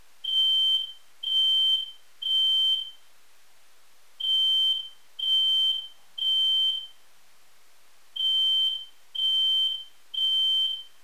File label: beeping; The bleeping noise